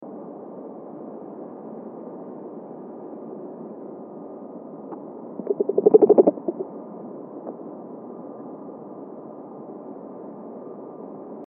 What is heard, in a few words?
Dolphins